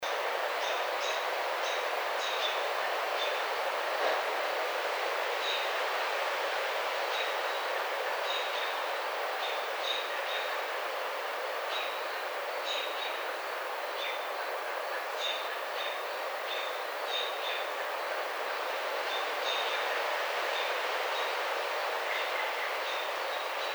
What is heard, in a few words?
American Robin